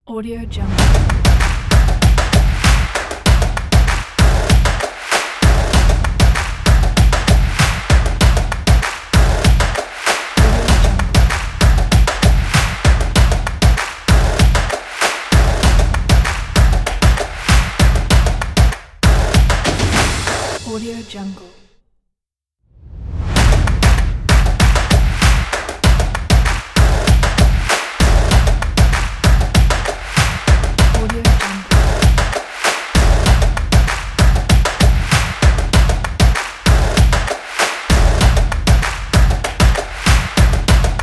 stomp drums and claps

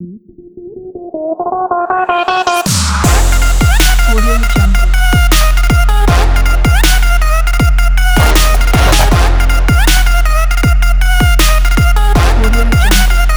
energetic trap hiphop logo pack; redoctopus

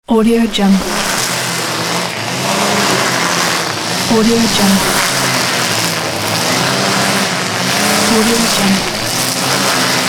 lawn mower

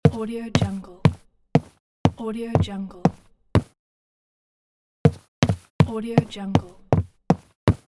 wood footsteps

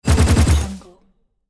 automatic weapon short burst

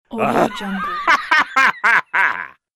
old drunkard laughter

